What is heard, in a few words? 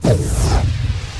air cast; DAoC